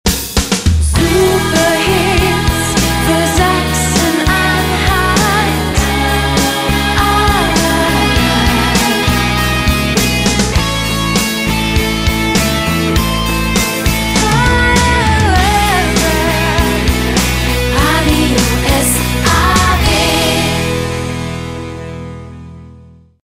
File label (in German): Jingle Radio; SAW